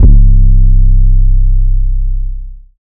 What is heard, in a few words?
808 Offset (C); Cymatics; Orchid Sample Pack; 808s & Basses